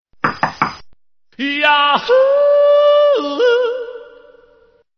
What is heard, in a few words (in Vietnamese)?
Nhạc chuông tiếng gõ cửa; yahoo